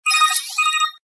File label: ringtone; nier